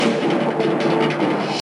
Desert Percussion

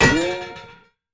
Metal Hit; bad piggies; android; mobile; windows

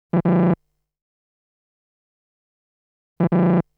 Quiz Wrong Answer, string quartet; Monopoly

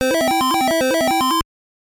Lose Life

